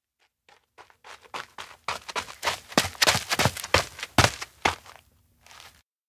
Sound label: steps run toward; rikka; anemoi